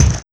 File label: Kick, Kit; Sonic Foundry (Sony Creative Software); Esoterik Beatz; Annoya